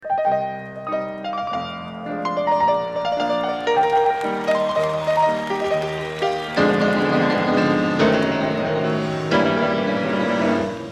Drama Music

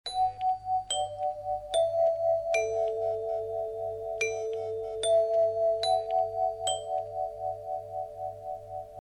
doorbell

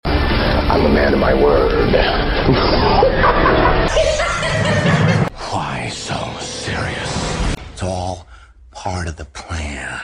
Ringtone; Joker

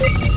requester Over; Star Trek

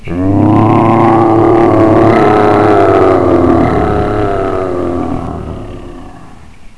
ogre echo; Halloween

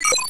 shell fast run; Star Trek

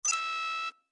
Beep; Star Trek

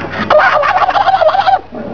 curly ell; Three Stooges

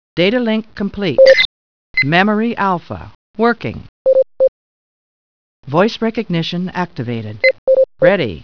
startup; Star Trek

